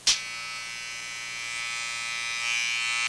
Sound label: electric arc, welding